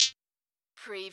formant

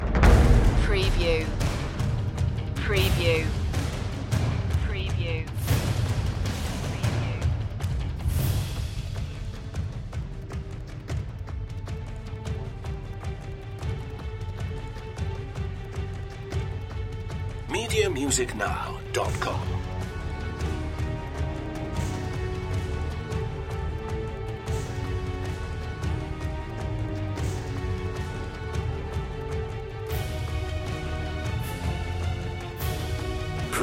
big hollywood dramatic score

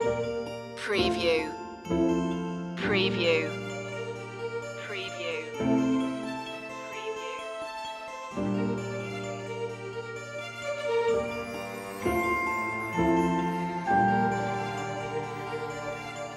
embrace loop

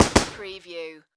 Machine Gun M240B

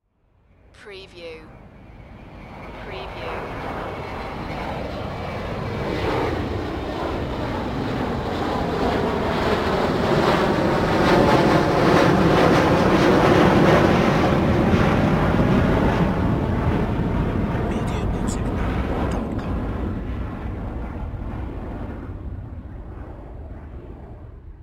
Airplane Fly By

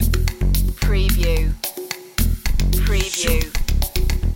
river adventure loop

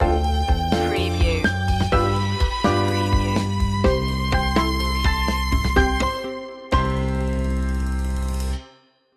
carefree day out stinger and ending

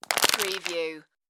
crush plastic bottle

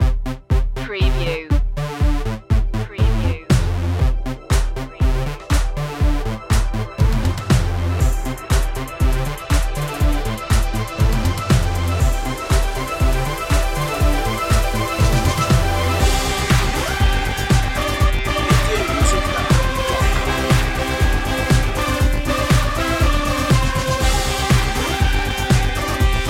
millennial pop no vocals

